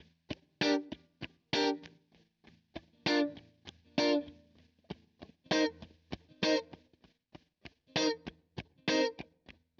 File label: Electric Guitar, Loops; Guitars